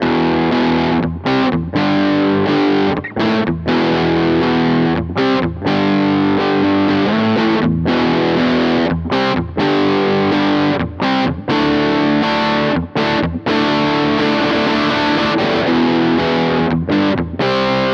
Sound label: Burst moderate gain bridge; Godin Radiator